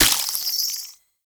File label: Baby Resource Pick Up; Warframe; Nokko; Reroot